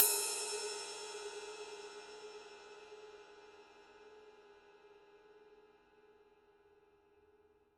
20 ride body, paiste 2002

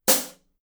16 thin hat closed; paiste; masters

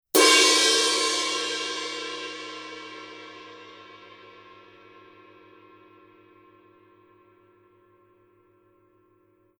color sound 900 20 purple heavy ride edge, paiste

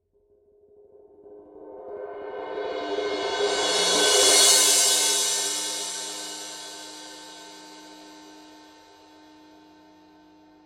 18 power crash roll, paiste 2002

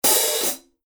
2002 black 15 big beat hi-hat open, paiste

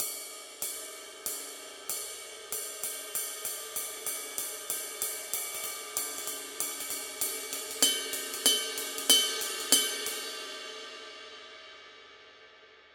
formula 602 classic sounds 22 thin crash pattern; paiste

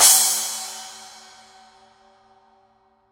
splash edge; paiste 2002

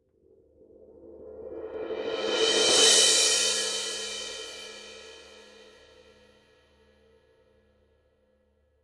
giant beat 20 thin roll, paiste

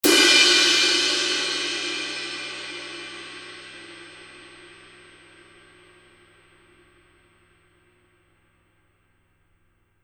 giant beat 26 giant beat edge, paiste

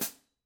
14 dark hi-hat chick, paiste; masters

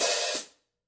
14 dark hi-hat open, paiste; masters

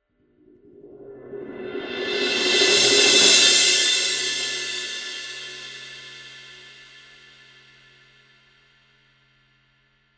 signature 20 fast crash roll; paiste

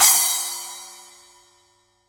10 splash edge, paiste; pst 5

